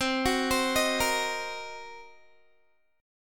C Minor 7th Flat 5th, C, Guitar; Cm7b5; arpeggiated

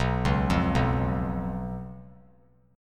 B Suspended 2nd Flat 5th B; arpeggiated; JGuitar

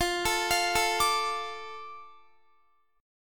F 6th F down Guitar; F6 arpeggiated

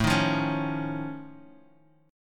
F Augmented 7th A, chord

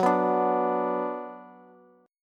Gb Suspended 4th Sharp 5th Gb, down, Bass; strummed; JGuitar

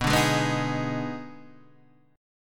Eb Major 7th Suspended 4th Sharp 5th B

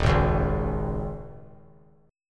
Eb 7th Suspended 4th; Eb7sus4; strummed; JGuitar

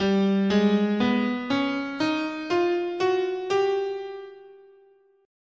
G Enigmatic, scale

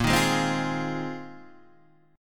A sharp 9th Suspended 4th A sharp, Guitar, Open D

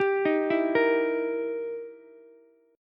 E Minor Major 7th Flat 5th, E, down, Ukulele; arpeggiated; JGuitar

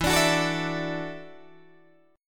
E 7th Suspended 2nd Suspended 4th, Guitar, DADGAD

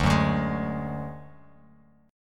D Major Flat 5th D Bass Standard, chord

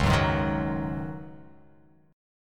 C sharp Suspended 2nd Suspended 4th; x,4,4,1,3,1,x,x

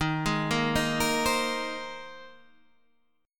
C Minor 7th Sharp 5th Eb

